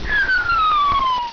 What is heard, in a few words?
MISSILE